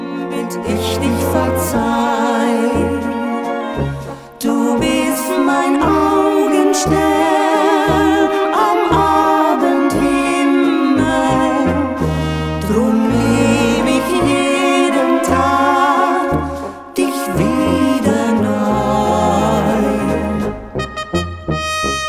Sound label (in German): Blasmusik